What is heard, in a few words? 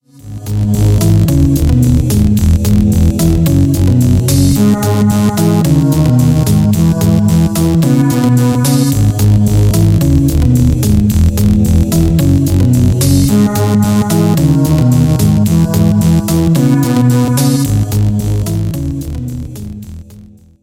rainbow theme